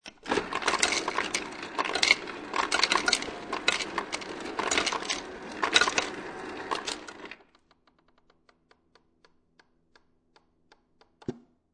Ice Machine Dispenses Into Cup, Household, Ice Machine Dispenses Ice Into Empty Plastic Cup; Kitchen